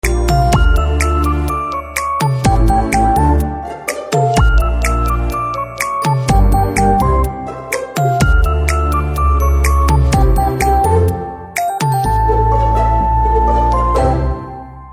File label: BGM Ringtone